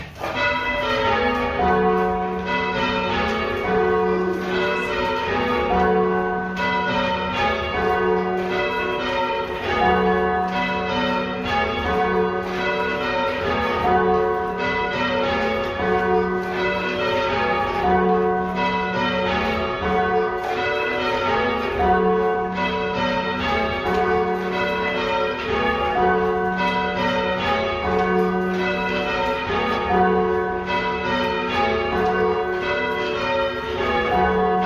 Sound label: Bells, Church Bell Ringers; Light-muffled Tenor recording